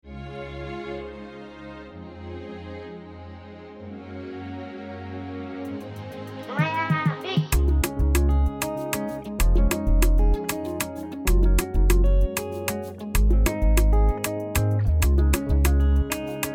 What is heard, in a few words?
afrobeat track